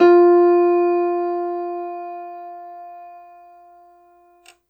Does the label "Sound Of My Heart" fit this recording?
No